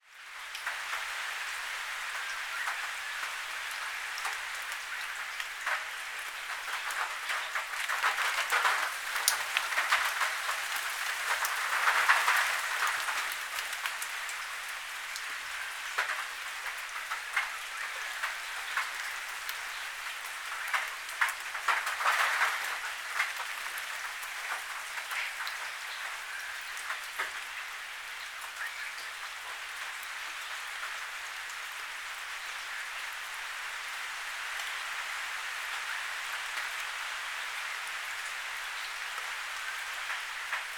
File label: nature rain light shower car port drips from trees onto tin roof